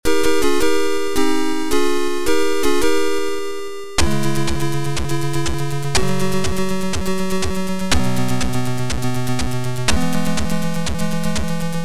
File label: Triple triad win